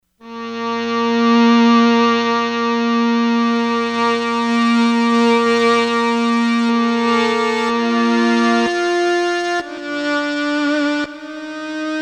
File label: Hitiriki, Korg MSC-13S Ethnic